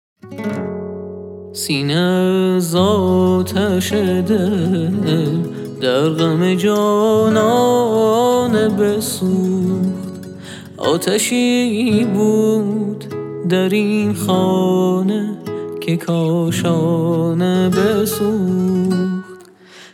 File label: Acoustic Version